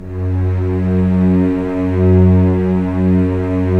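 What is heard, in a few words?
STR String Section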